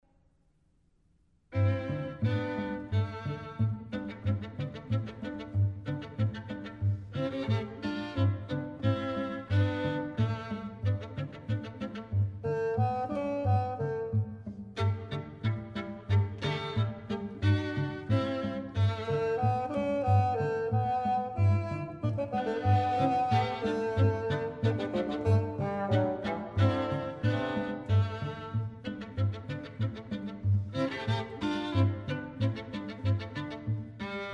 Musique de la 3rd scene

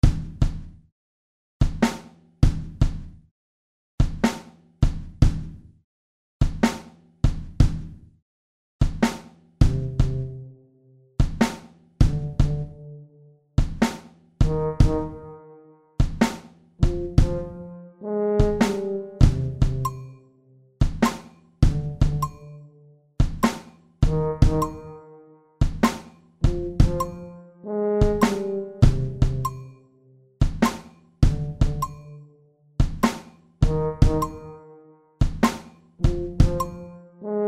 tuba theme batt cloche

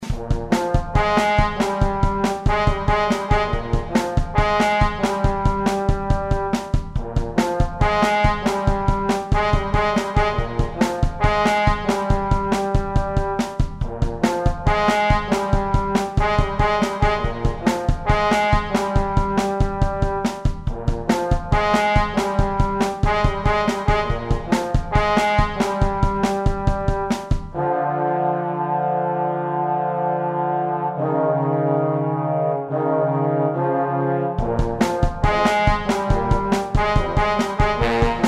Blues trombone batterie

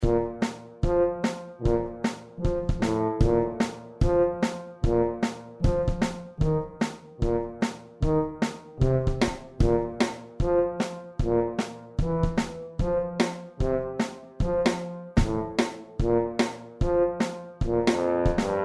tuba batterie

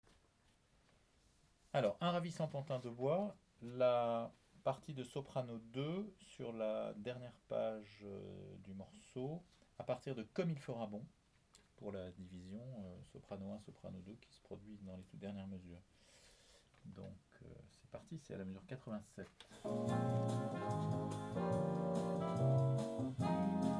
Sop 2; Pinocchio

